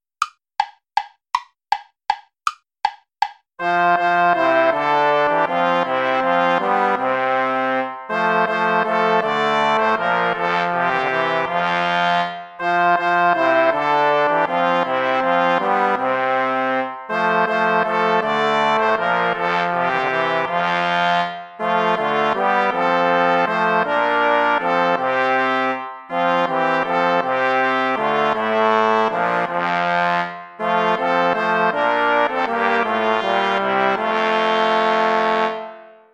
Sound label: play along without trumpet; vulpius melchior; lobt gott den herrn ihr heiden all